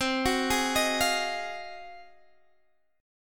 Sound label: G sharp 13th C